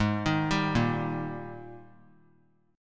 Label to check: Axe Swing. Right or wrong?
Wrong